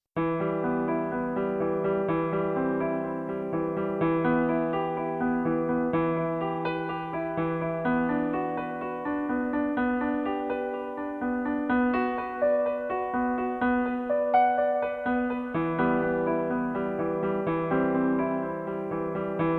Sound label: piano